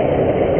Bigfan; Buggy; PS1

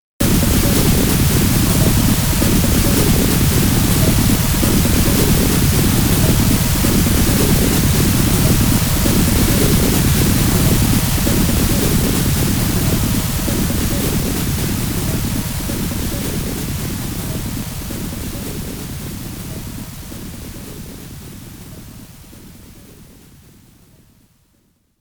Waterfall; SNK Arcade Sound Digital Collection Vol. 24

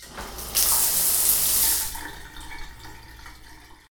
Washing

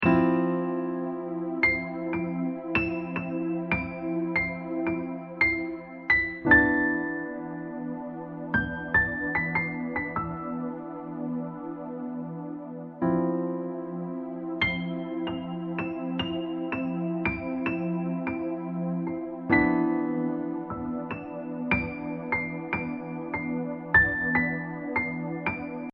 bgm rain; Ange Vierge Girls Battle

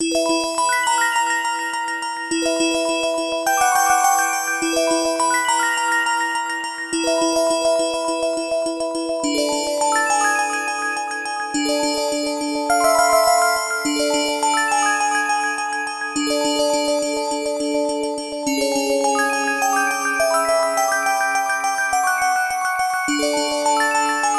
Tower (Fast); New Super Mario Bros. 2; Gold Ring layer

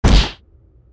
Web Throw; Fleeing The Complex

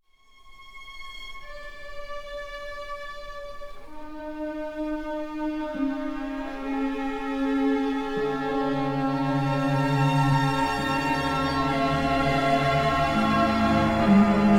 Suspense

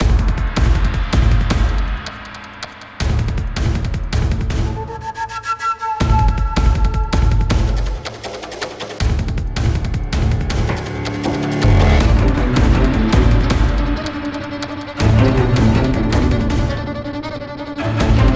Bio Lab Combat Loop; Call of Duty Advanced Warfare; PS3; PS4; Xbox 360

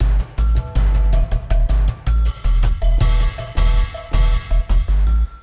sounds music; Halo Combat Evolved; Xbox

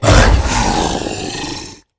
damage reaction; ice age scrats nutty adventure

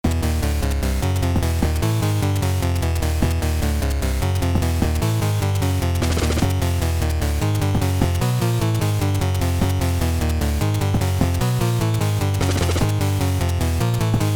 Hurry Up!; Pirate Ship

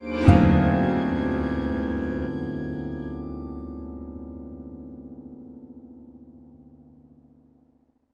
Sitar Orch

